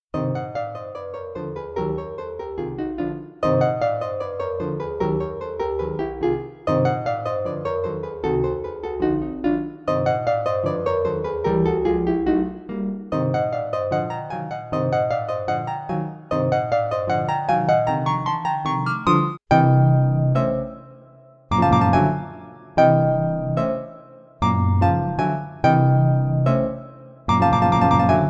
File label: Arpa